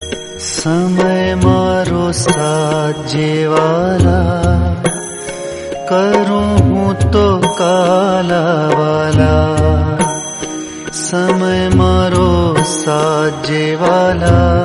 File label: gujarati bhajan